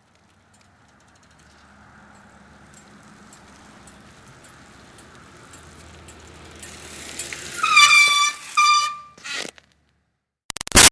Bicycle Retro Cruiser Up Stop Med Pedaling Brake Squeak; Bicycles; Vehicles